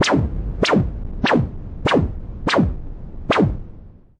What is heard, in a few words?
noise Modular, Noise Sweeps